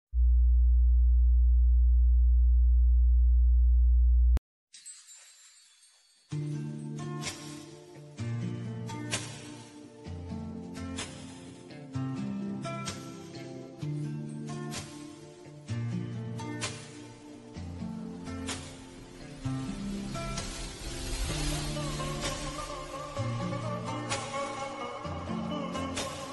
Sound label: instrumental ringtone hindi ringtone instrumental bgm ringtone